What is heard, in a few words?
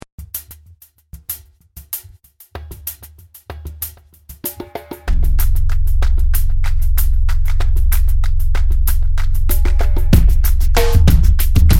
beatz Sub BASS